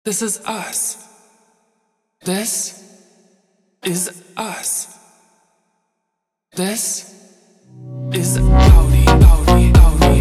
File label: Funk slowed